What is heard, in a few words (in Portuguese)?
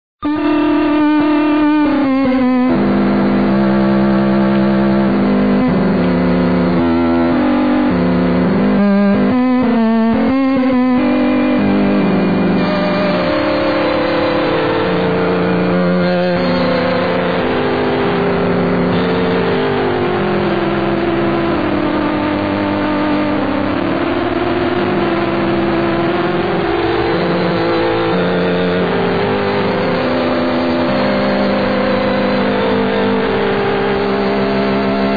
toque